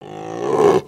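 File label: Sabertooth Beg; creatures; pets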